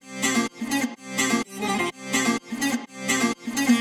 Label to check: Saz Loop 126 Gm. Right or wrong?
Right